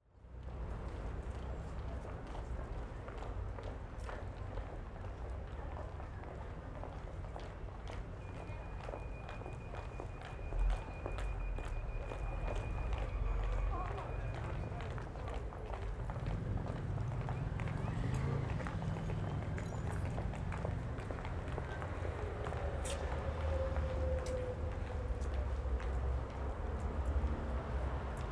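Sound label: passers-by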